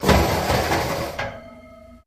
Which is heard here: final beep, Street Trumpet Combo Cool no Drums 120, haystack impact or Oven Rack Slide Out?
Oven Rack Slide Out